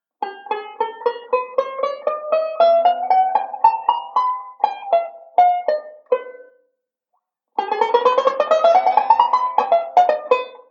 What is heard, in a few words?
Chromatic Run, banjo; Beyond Chord Melody Volume II